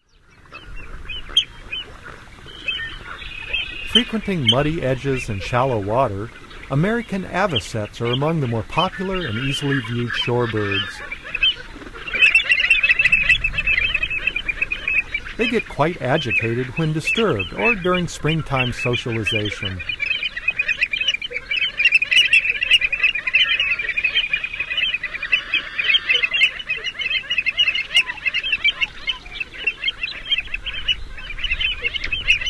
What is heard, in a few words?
American Avocet; North America; Common Western Species; Know Your Bird Sounds